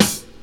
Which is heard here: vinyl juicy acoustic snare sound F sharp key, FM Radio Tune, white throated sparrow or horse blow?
vinyl juicy acoustic snare sound F sharp key